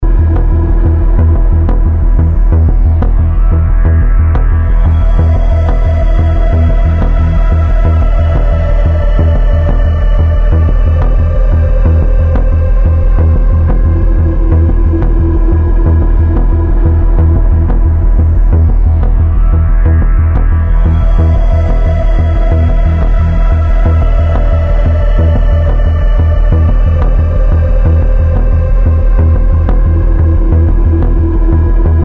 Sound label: Barter Town - Ambient Loop; deepfear